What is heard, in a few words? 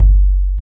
EQ Perc, Percussion Mix